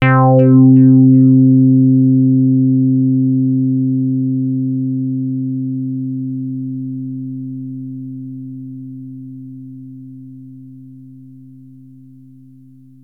Access Virus B; Synthworx

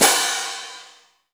PLETES, ORCH PERC; Greytsounds VOL-1; ORCH PERC 2

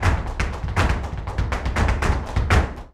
Flamenco, Tapping, Bulerias, 121; ProSamples vol.46 - Flamenco

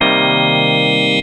synth organ, dx7

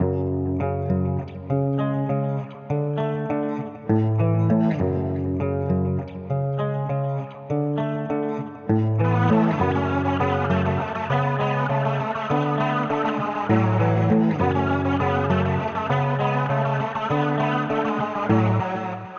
Guitar Loop, alternative; soakedinbleach; 99Sounds x Monosounds; Instrument Loops; Guitars

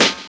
DR. LOOP, DRUMS; X-Static Goldmine 3

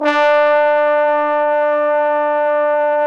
BRS FF BONE, Bone Sec.1; Roland LCDP06 Brass Sections

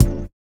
VIBRA; Spectrasonics; Metamorphosis

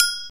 Agogo Hi, Yamaha RX21L drums

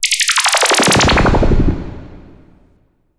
skitter

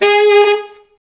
SAX Alto STAB, Alto Section FX

